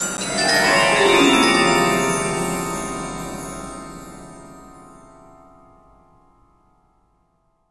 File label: Saloon Stinger; Back to the Future: The Game; Android; PS3; Wii; Windows; Xbox 360